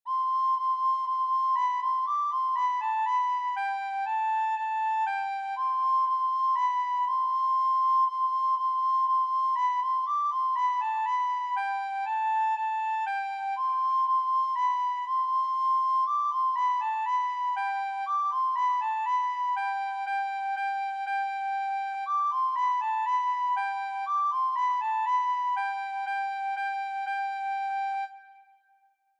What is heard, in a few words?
Soprano Recorder; Clog Bransle